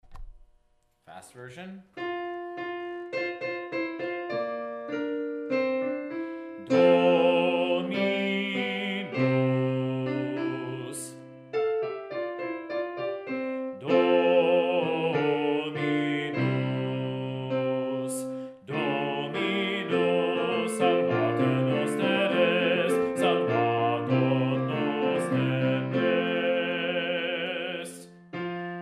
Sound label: Fast Bass; En Natus Est Emmanuel-Fast, M. Praetorius; Choral Resources